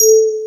Electric Pianos; Wizoo Electric Pianos

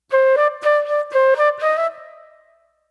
Soul Plate Flute

